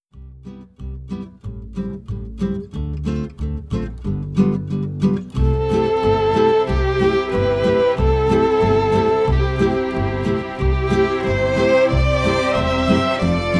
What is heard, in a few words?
instrumental; CanCan